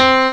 GMC piano; Amiga Soundtracker Sample Packs

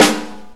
Acoustic Snare; Digital Sound Factory Xtreme Lead

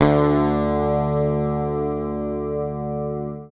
PICK, Bass Library

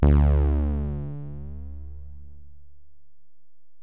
Bell, Alesis DM-PRO

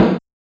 Kit Snare, Realistic Concertmate-670; One Shot Kit

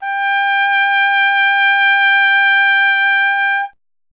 TP G; Miroslav Vitous; Solo Instruments VOL-1

